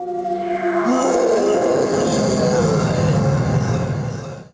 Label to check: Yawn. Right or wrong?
Right